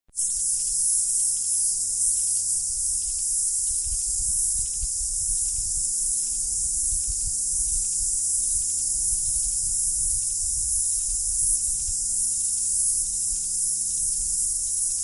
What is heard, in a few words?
wainui falls track forest